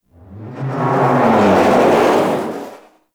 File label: Whoosh Step; Enemies; Steps; Warframe; Eidolon; Teralyst